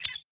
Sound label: prev next